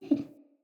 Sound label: Wolf Cub Sleep Idle Yip; Vocals; Animals; Orengal Wolf Cub